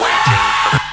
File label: MUSIC STAB; AMG - Vince Clarke Lucky Bastard